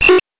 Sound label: randomize, 8 bits